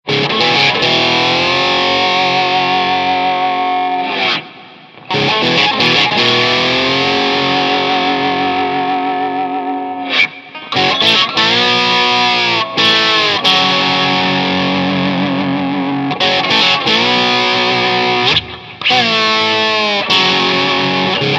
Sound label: Modern Dist Suhr Tele Slide Gtr